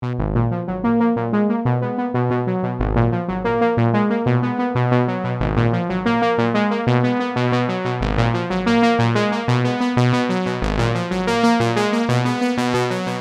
funky wah lead; bluewave

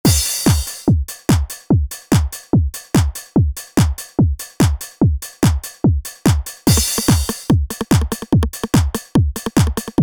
d909 kit; superwave

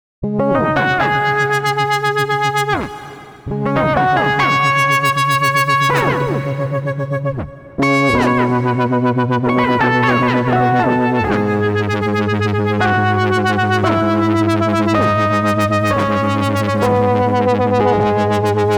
pitch envelope seq; the digital life

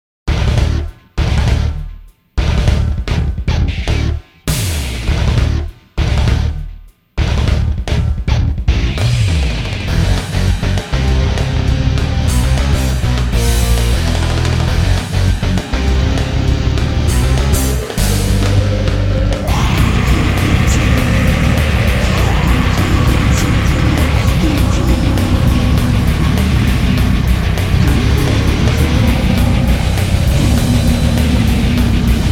metal bass

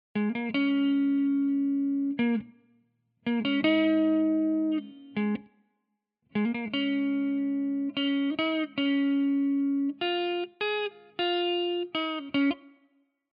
les paul wet; chiqui audio

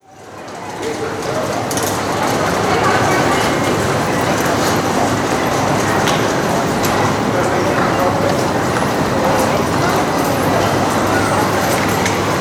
bus; echotopia; soundfellas creative audio studios

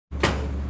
scrap metal door hallow; junk yard metallic and impact fx; nova scrap yard; nova sound

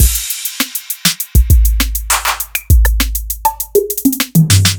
acoustic 808s kit; by uppercussion

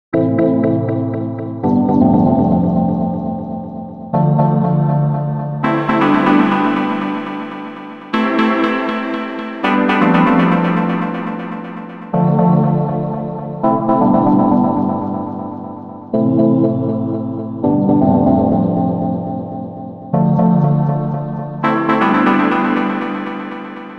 single delay phase inverted

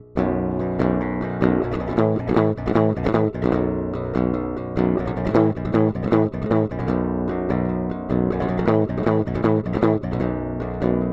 Dry Guitar; Kobol

